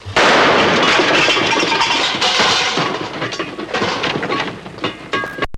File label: Pots Feet Shuffling, ransack room; Pots, Feet And Shuffling